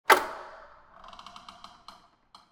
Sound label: open creaky, doors industrial; dragonroll